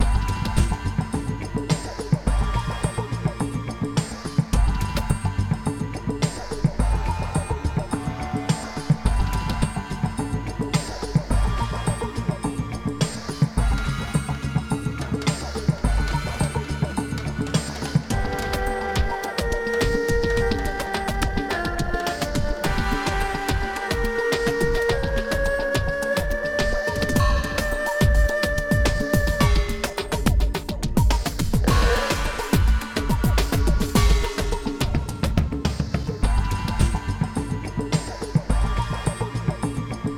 Stealth LOOP, soundtracks

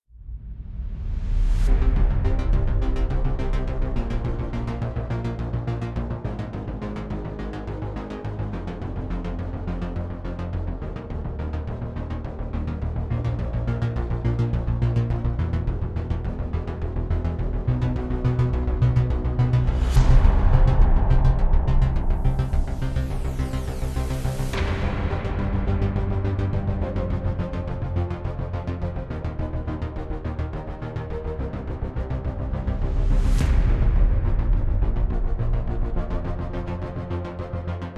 Music Template - Tension; mass effect 2

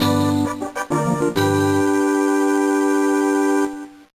Fanfare; Lunar Legend; gba

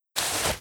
ui item holster; lucius

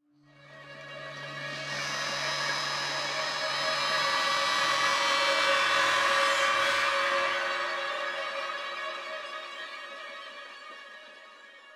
Stinger Intensity; The Park

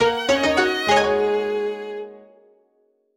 Jingle Opening China; clubhouse games 51 worldwide classics 2020 switch gamerip; Salon

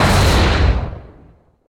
Explosion; boomerang fu

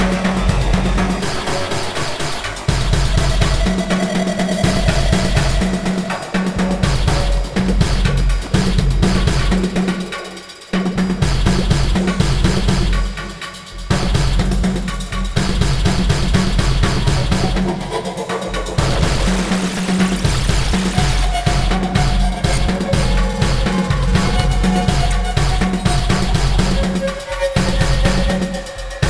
pulse

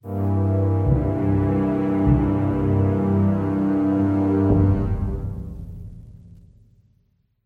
Sting Suspense

